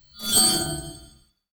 Plasma Magic Cast; RPG; SFX; Cielonos

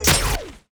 Gun HIT light; SFX; Cielonos

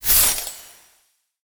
Ice Magic Freeze Short; RPG; SFX; Cielonos

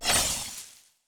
Ice Magic Misc Attack Missed; RPG; SFX; Cielonos

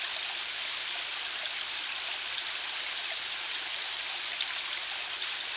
RAIN Suburban Medium loop stereo; SFX; Cielonos

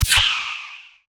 Bow ARROW flight; SFX; Cielonos

